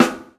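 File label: snare drum 70s, drum kit; E-Lab; X Static Goldmine 2; akai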